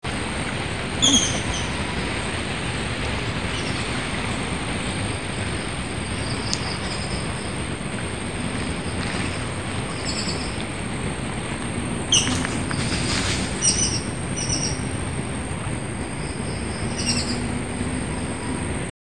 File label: Tupaia glis, Common Treeshrew; Windsor Nature Park, Singapore, 31 Jan 2018, 1830 hrs.